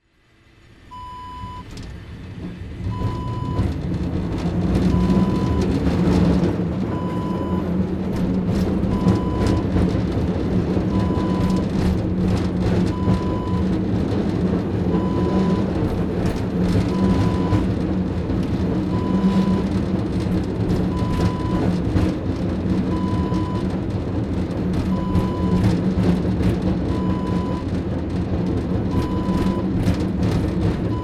tram ride

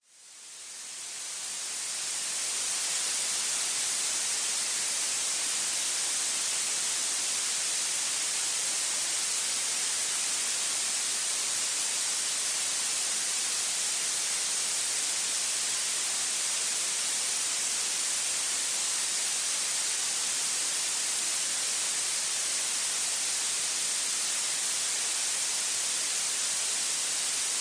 Blue Noise; one hour

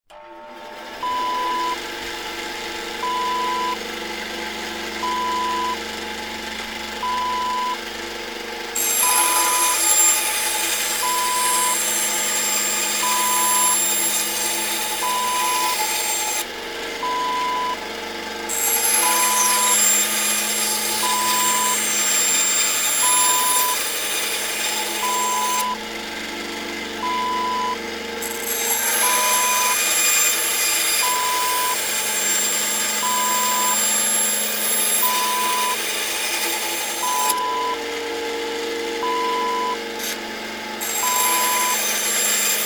band saw

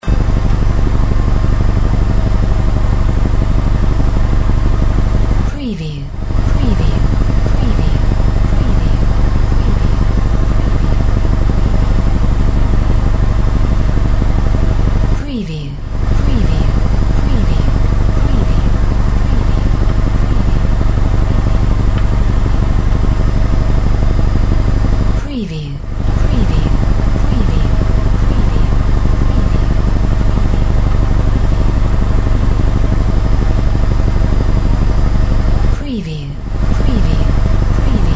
helicopter large interior; windy beach audio

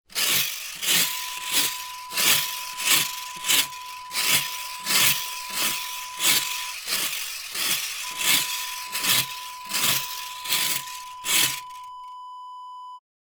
leaf rake

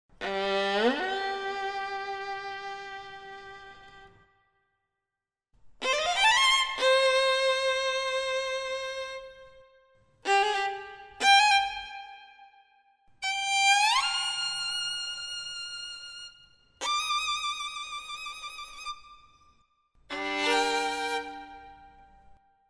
Solo Violin; VirtuosoSoloViolinVol.1

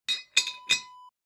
glass cork close

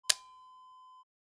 camera shutter release button

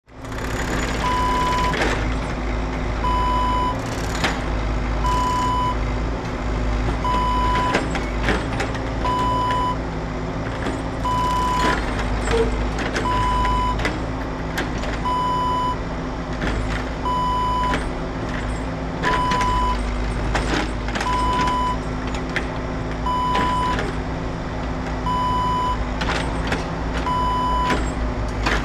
road construction

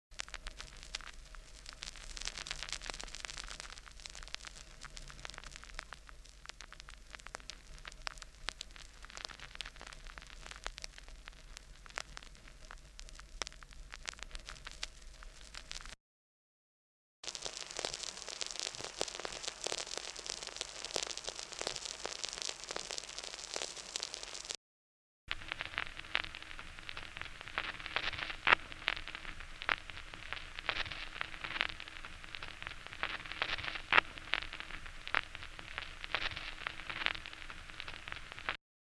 VINYL NOISE

